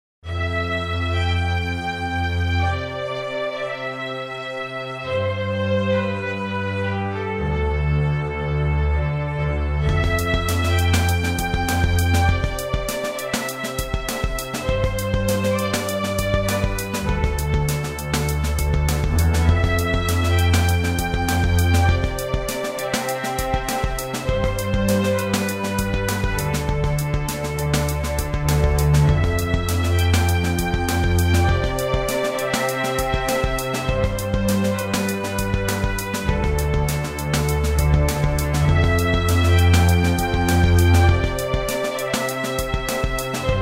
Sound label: strings